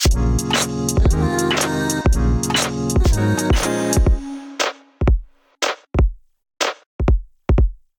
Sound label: Keys Kit; Drums; Keys 2 Love Kit; Ableton Factory Packs; Beat Tools